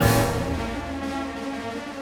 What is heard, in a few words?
Orchestral Loops; 128 MANHATTAN